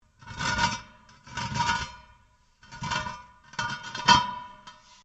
METAL ON STONE LARGE SCRAPE; Metal (Sound Effects:Materials)

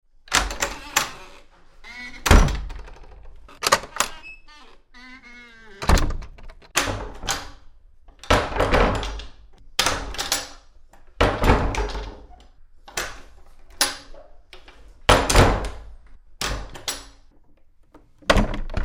Latch Doors Open and Close Various; Variety of interior wooden latch doors; Miscellaneous Door; Tom Hutchings

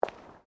FOOTSTEPS WOMENS LOW HEELS PAVERS STEP; Footstep Foley; Footsteps (Sound Effects:Human)

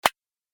Face Punch

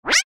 Rollover Squeak, Bright, Scrape; Mouseovers; Multimedia; Production Element, Imaging Element, Accent, Transition; Blastwave

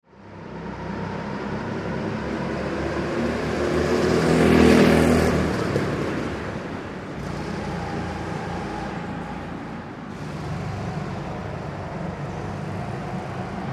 Go Kart Track Indoor Ambience; Go-Carts; Vehicles; Go Kart Track Single Drive; Sports; Blastwave

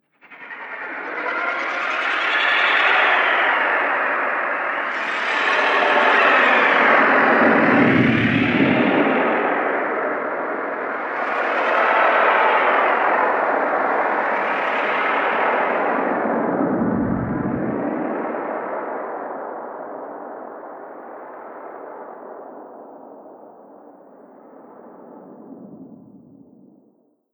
Horror Ethereal Presence; Scary Soundscape Sound Effects

